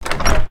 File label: open, chest; Minecraft; Votive World